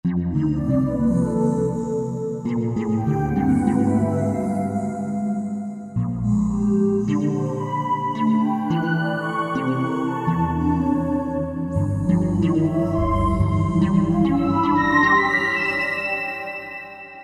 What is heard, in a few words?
Kompakt Voic, Roland SRX-05 Supreme Dance